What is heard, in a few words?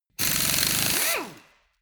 impact wrench screw single